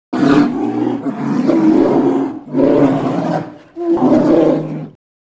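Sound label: bear polar growls roar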